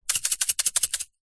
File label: sweetener tablets shake plastic pot; zapsplat